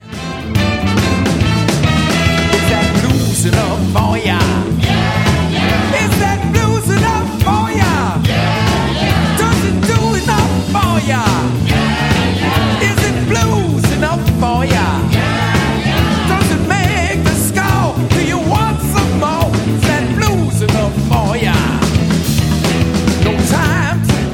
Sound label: Blues